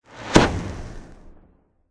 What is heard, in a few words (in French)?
explosion sourde